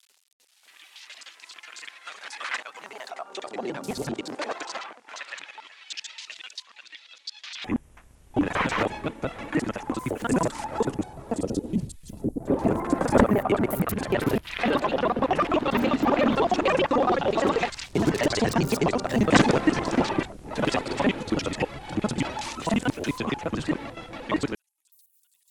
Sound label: RINGTONE